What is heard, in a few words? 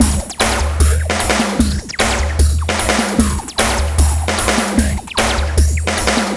LOOPS 150; Masterbits - Tekkno Trax; D LOOPS